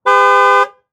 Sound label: Truck GM Envoy Honk Horn Medium; transportation